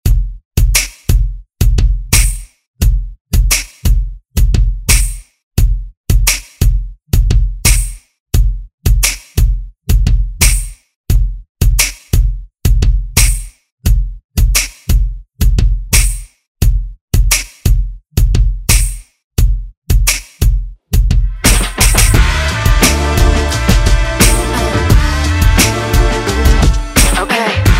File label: Top40 Redrum